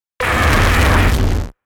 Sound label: Fuzzy Crunch Rumble; Glitch; Blastwave